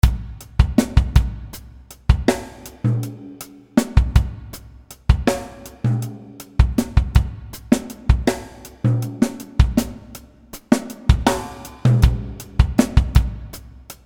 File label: jazz fusion rythme